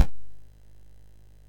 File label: sid MOS6581R2; California Games